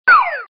fall in

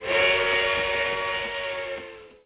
train horn

